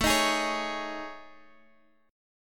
A Major 7th Flat 5th, Guitar, Drop D; chord

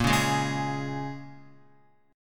Bb-Minor 7th-Bb Guitar; Bbm7 strummed

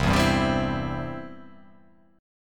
C Major

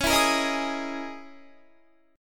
Db Minor 6th Add 9th Db down Guitar; strummed

